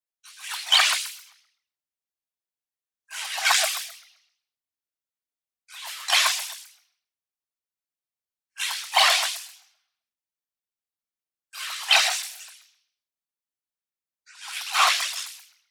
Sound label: MOVEMENT FAST Metal Cup Shake Sparks; boom library; Anime Essentials; DSGNMisc